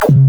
Dr Kick

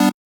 Chord

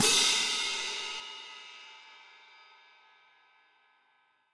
cymbal

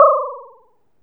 CLASSIC ERROR; maimai

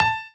piano melo

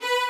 violin

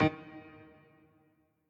piano riff intro

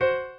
piano

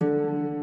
harp

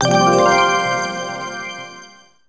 SE RESULT GEM GET; maimai

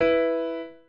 piano

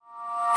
musicbox

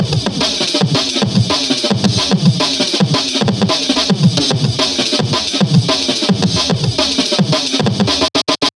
Dr Loop break

